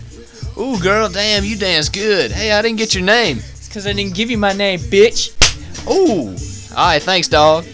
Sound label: slap